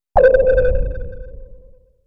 Sub Sound; AMG - Prototype Drum & Bass

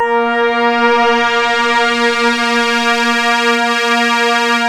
A#, Synclav FM; Big Fish Audio; SPACE1

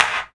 CLAP, Dance Drums

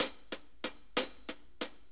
6-8 HiHats 60, HiHat & Ride Loops; Sonic Foundry (Sony Creative Software) - Loops & Samples Drum Tools

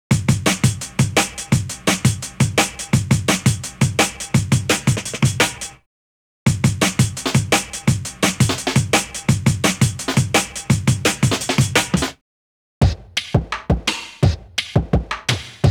breaks, Jungle; Zero-G Jungle Frenzy 2

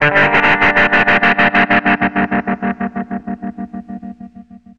Chugga Chug 150 D, Guitars; Total Drum&Bass

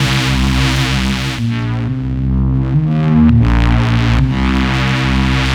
Som Bass, Drum n Bass; KJ Sawka; Drum n Bass & Beyond

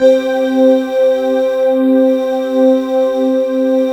SYN, Wavestation

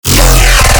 BASS SHOT F; Jawbreaker

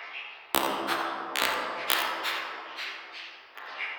sparse soundscape samples; ReLooped

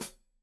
Hat Foot, Hi-Hat, Cymbals Stereo

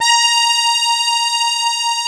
ZUPAN ZUG, AKKORDEON; Best Service; Alpine Volksmusik

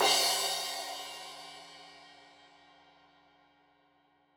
Crash 15in L edge, Cymbals Stereo

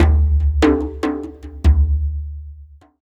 Dumbek Big; Ambient; Sonic Foundry (Sony Creative Software); Ambient Atmospheres & Rhythms

